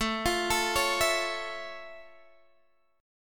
F Augmented Major 7th A

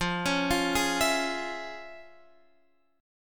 F Minor Major 7th Sharp 5th Guitar Open F; arpeggiated; JGuitar

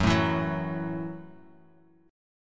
F sharp Minor 7th F sharp; strummed; JGuitar

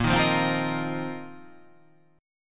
A sharp Suspended 2nd Suspended 4th down

